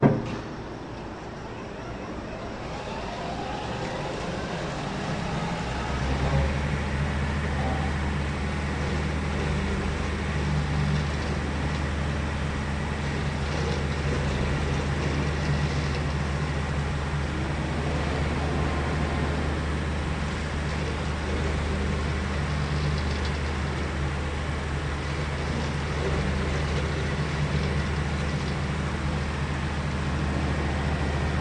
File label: Mill Motor Lrg Wind, milling